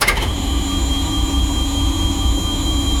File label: electric wind up of an el, locomotive